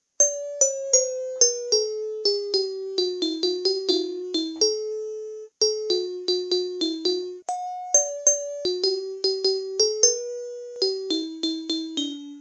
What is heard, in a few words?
liberty bell; chimecollector